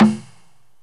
STACKED PERC

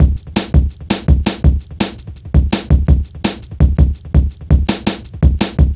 Funki Tuff, Jungle, Drum Loops 166.5

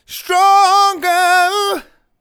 Stronger, Vocals, Male

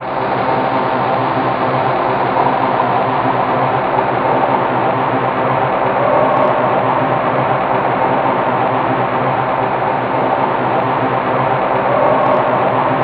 ATMO, Special FX Twisted; USB Soundscan; AKAI

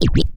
Scratch OS; Sonic Foundry; Rondo Brothers Trip-Hop Loops & Samples; New York Kid

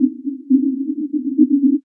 Vinyl Bumpers, Fx; Sonic Foundry (Sony Creative Software); Esoterik Beatz; Vinyl101

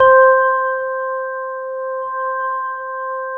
C4, CLUB ORGAN, Roland JV 2080

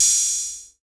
Dr. Rhythm 110, analog; Sound & Vision - Gigapack I

